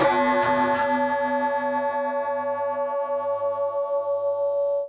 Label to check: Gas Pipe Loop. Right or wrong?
Wrong